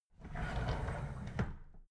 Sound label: drawer big squeak close regular